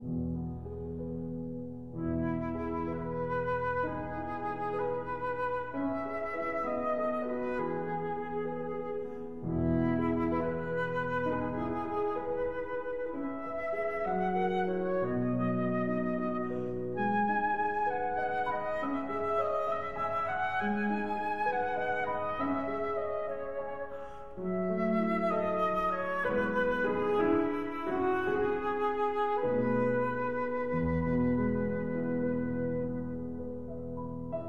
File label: Berceuse (Lullaby) for flute and piano